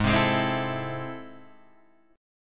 A Minor Major 7th, G sharp

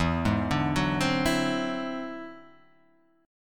E Augmented 9th E down; E+9 arpeggiated; JGuitar

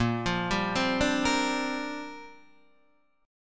A sharp 6th Add 9th, Guitar DADGAD

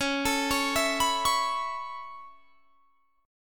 C sharp Minor 7th Sharp 5th C sharp; C#m7#5 arpeggiated

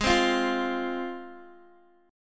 G sharp 6th, Guitar, DADGAD; x,11,10,10,8,x; down; chord shape

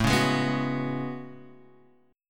A Major 13th, down Guitar Half Step Down

